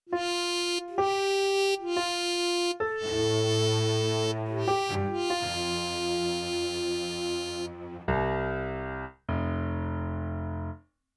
sad ending

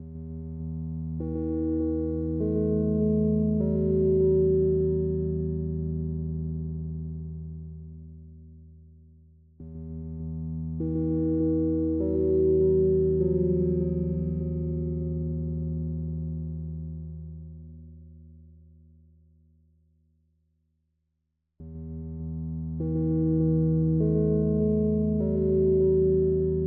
lose

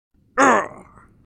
player sfx

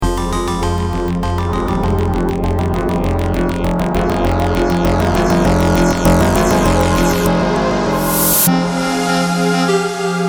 black mist lead in for imminent battle loop

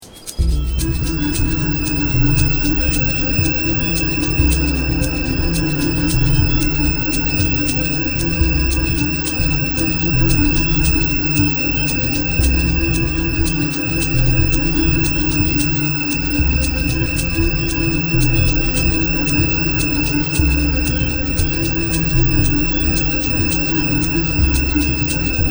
music level

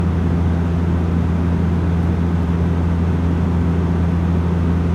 airplane prop

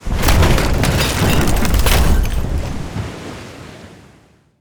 ship ram ship shortened

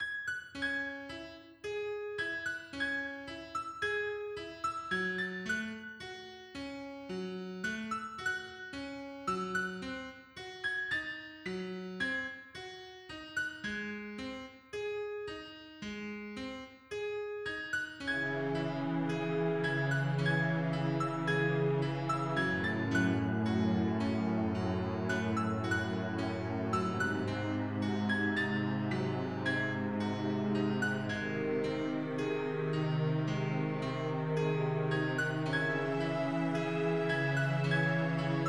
Sad ballade

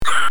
some kind of being sound

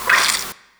splurt

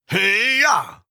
effort grunt male

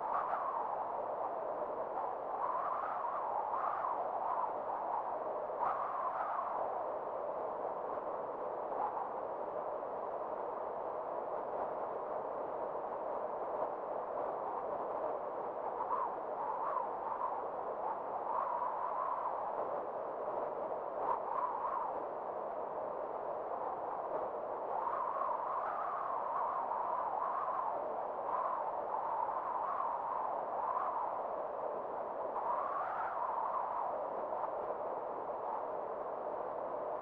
wind

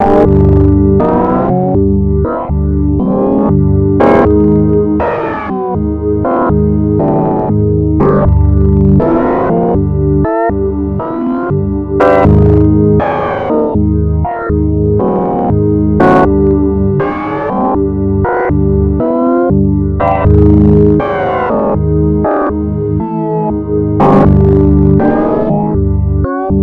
rhythm

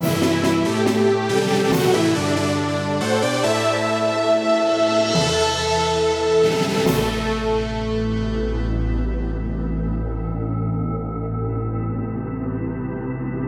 Victory Tune

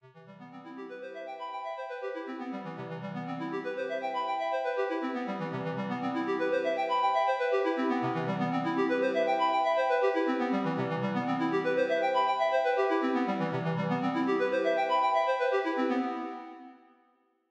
tractor sting

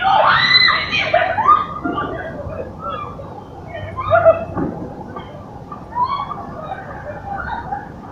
children

